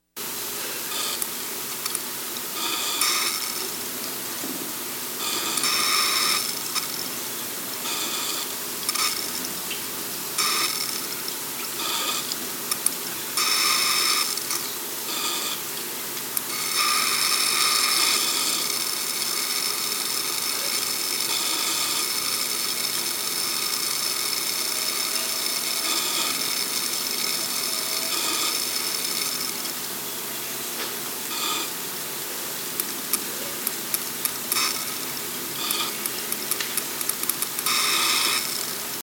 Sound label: Berry Fizz Loop